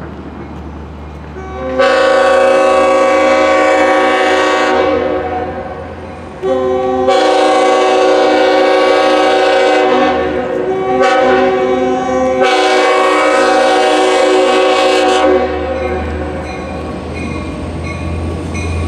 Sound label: departing (K5LL, fouled); Mineola November 2018; lirrhorns